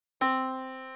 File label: Yamaha Disk, piano sound